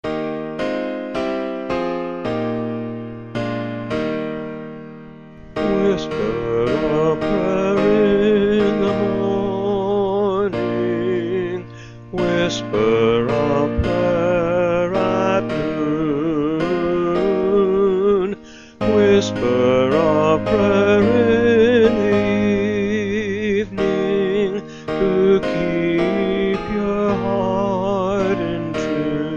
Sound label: whisper a prayer vocal